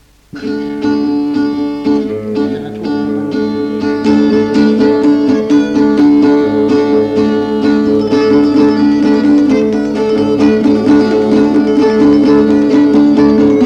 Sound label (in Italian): polka chitarra a quattro mani